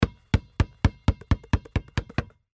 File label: ball bounce multiple